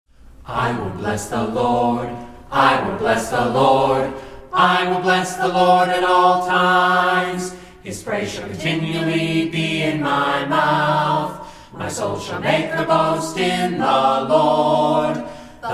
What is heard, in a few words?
a capella scripture song